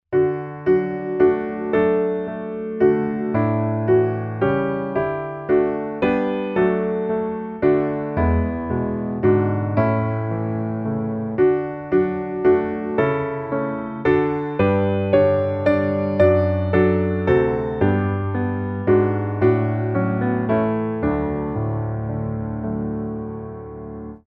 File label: piano